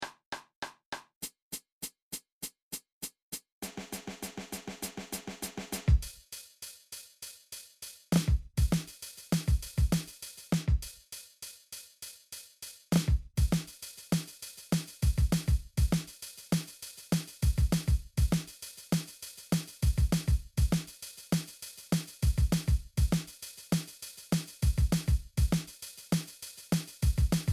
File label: drums